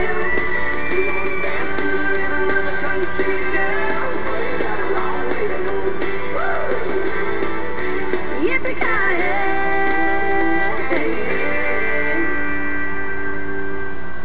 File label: yippy